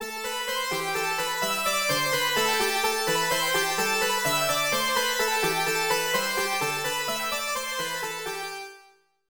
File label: Stage Clear; GBSS Alisia Dragoon; Saturn